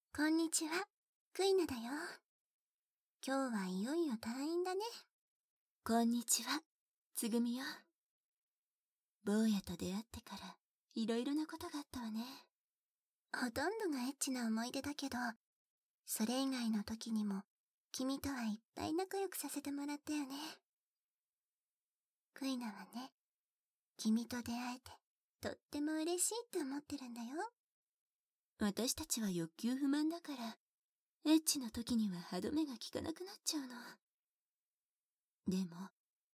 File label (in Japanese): お別れ耳舐め♪; 変態ナースさんたちの耳舐め精液検診♪; 変態ナースさんたちの、お別れ耳舐め♪